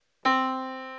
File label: Yamaha Disk, piano sound